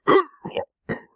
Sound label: Hurt; Amanita